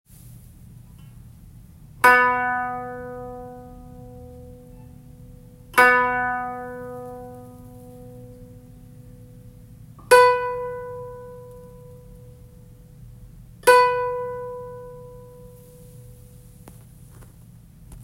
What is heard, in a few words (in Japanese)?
調子替, 箏 調弦